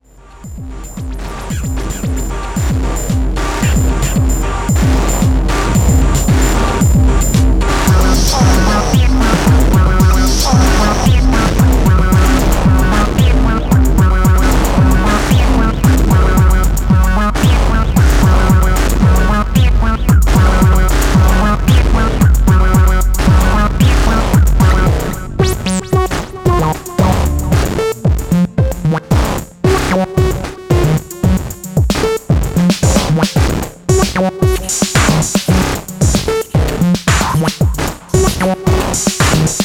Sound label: Tek and noise